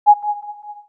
Bluetooth Search; Samsung SCH-M710 T Omnia II

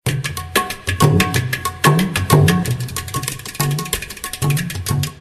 Indian Drum, ringtones; Hisense U820

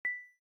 cam start, ui; LG V60 ThinQ

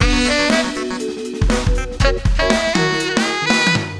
Jazzy, ringtones; Google; NewWave Labs

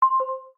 Auto Power off; Samsung Galaxy NX

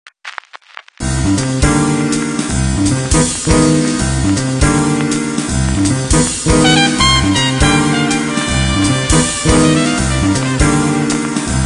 Re groove, Ringtones, Nokia; 6111

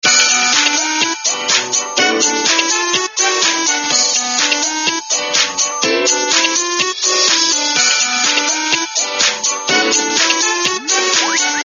Feel Groovy; Samsung; SCH-U650 Sway

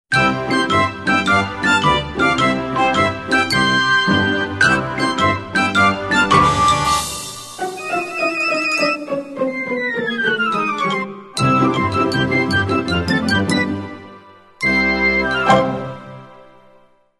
quirky, phonetones Motorola Q11

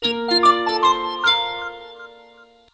Alert; Samsung; SGH-i300

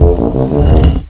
change form rock; Bounce Touch

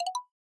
drumset, drums

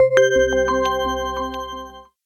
Unlock, phone ui; Fujitsu; Arrows-NX-F-01F

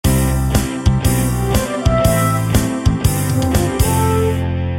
Classic Pop, ringtones; Google; NewWave Labs

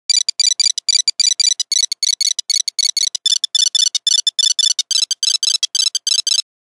Nokia Buzzer Hummingbird; Windows Phone apps

